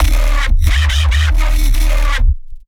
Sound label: Growl d sharp, bass